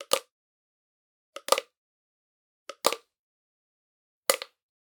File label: Generic Button Plastic Small; boom library; The Interface